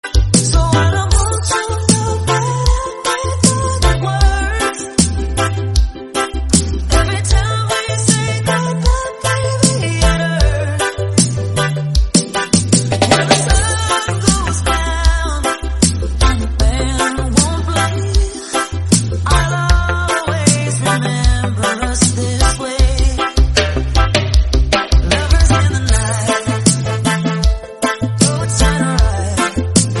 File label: reggae remix